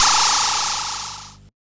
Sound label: Sci fi